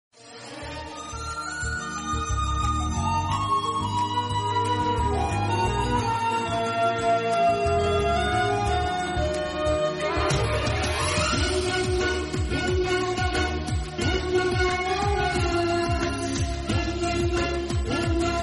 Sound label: Love BGM Telugu Ringtone; Malleswari